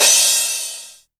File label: crash, Korg Electribe ER-1; Kit 6